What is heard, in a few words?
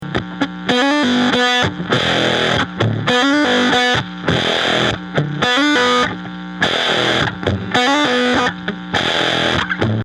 trash crash, waveformer; deathbyaudio